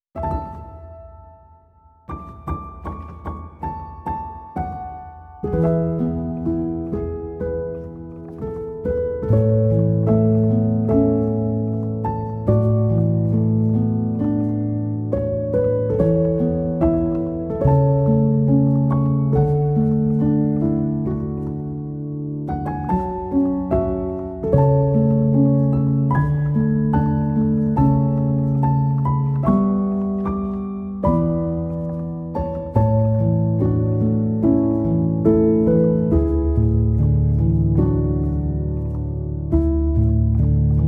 Sleepy Version, Piano Version; When Marnie Was There

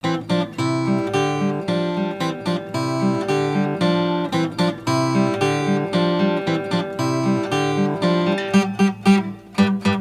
Instrumental

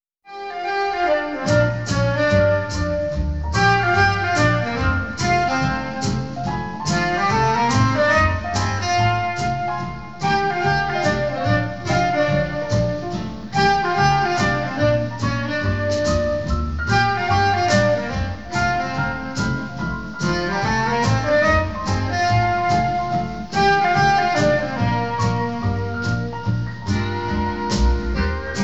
Instrumental; Disney

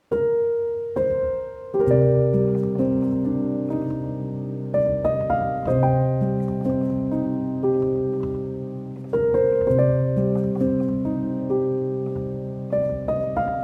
Piano Version; Nausicaä of the Valley of the Wind

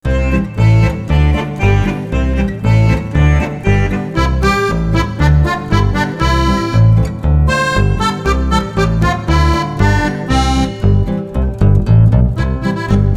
Instrumental

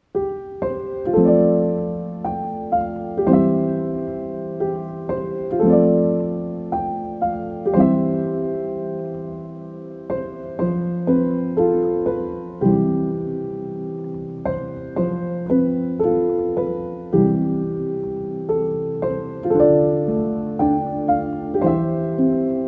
Piano

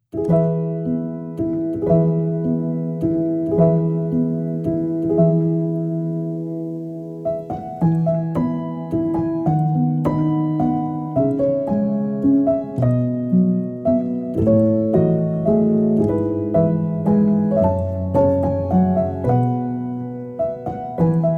Piano Version; Spirited Away

